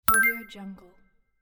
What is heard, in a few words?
pick coin